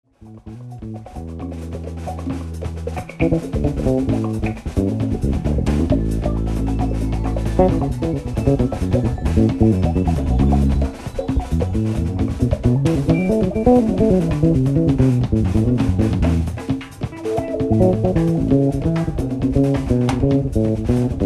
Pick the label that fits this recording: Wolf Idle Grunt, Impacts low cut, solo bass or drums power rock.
solo bass